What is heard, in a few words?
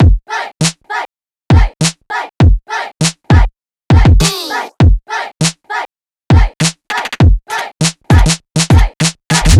Loop 100BPM